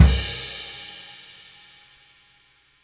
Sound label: end crash, World Rock